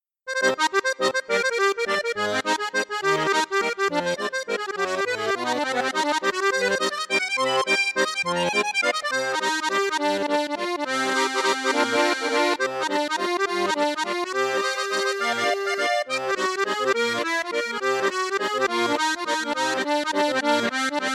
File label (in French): registre flute juste flute haute, accordeon; piermaria 312